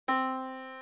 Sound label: Yamaha Disk, piano sound